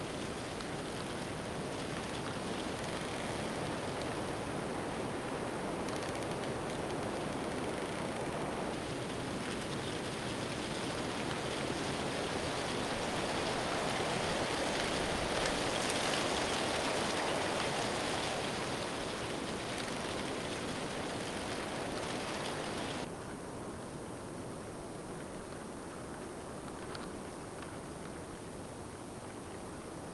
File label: windy storm with trees rustling and bird croaking; sonniss gdc